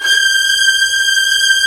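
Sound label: violins G 5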